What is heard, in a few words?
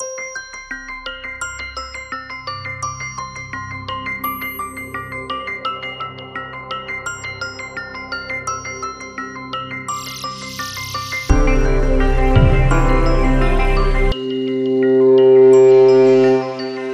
Ambient